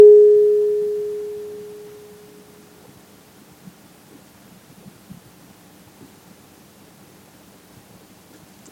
bones, tuning forks human organ weighted

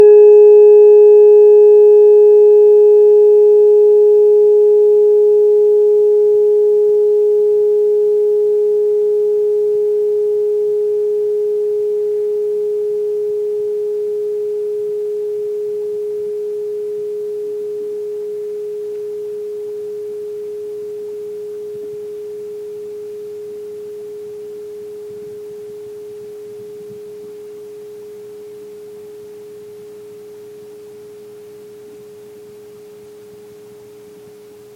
bone, tuning forks human organ unweighted

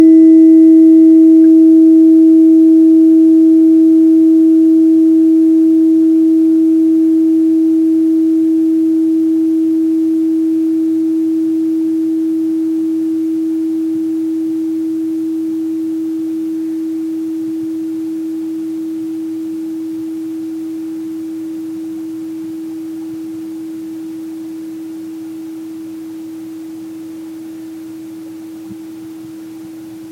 blood, tuning forks human organ unweighted

